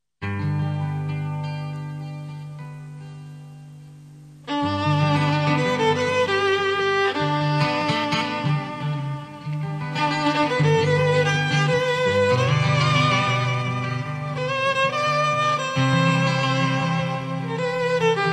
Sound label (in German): doina aus siebenbuergen; sharaban